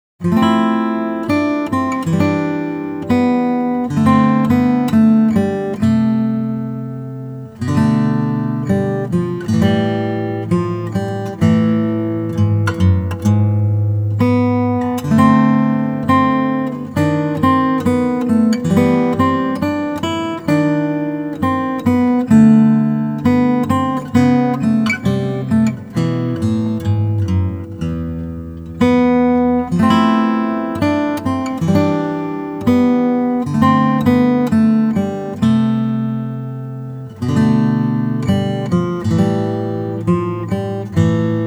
Small Shrine, easy solo guitar

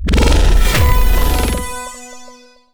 Character Defence

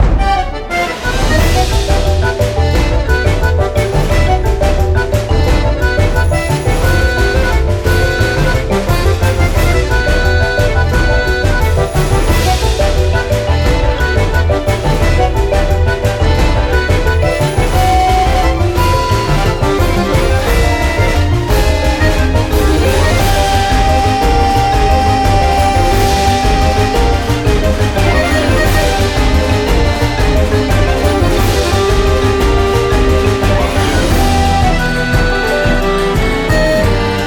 Blast Loop; Murasama; Android

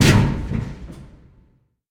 Pilon Low Impact

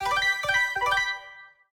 Ui Level Increase

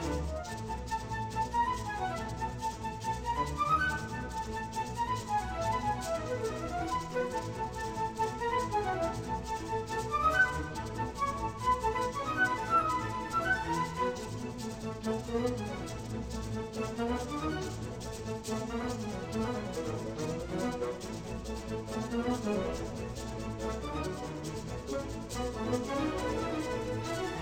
Short Punctuations Energetic 140bpm Loop; asterix obelix slap them all 2

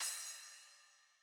Field Battle Ground Easy Hit Large; gamerip; The Legend of Zelda Tears of the Kingdom

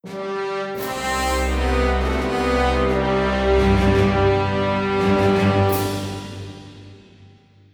Sting; CounterSpy; Android, iOS, PS Vita, PS3, PS4

